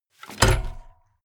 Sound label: bmx bike bar spin heavy